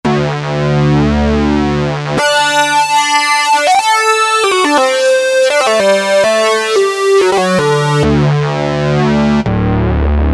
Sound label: Mod Wheel Sync